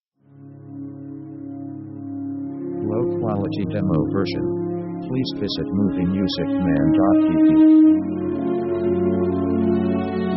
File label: orc adagio sordino, orchestra